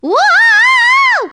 whooooooooaaaaa; peach

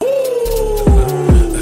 woo

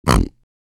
pig oink oink

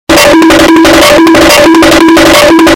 loud cartoon run sound

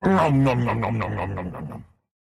omnom

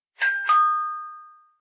pixel gong

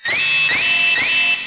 alert camera; mgs

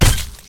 death sound

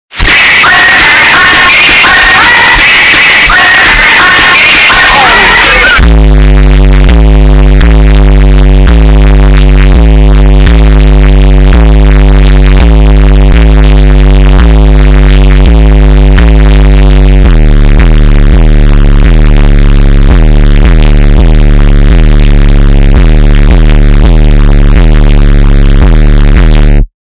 bass boosted

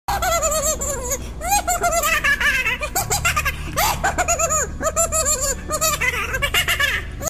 giggle; roblox

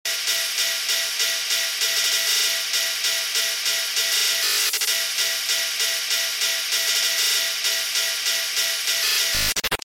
horror; sprunki pyramixed

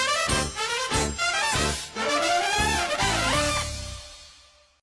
trumpets; moto gp

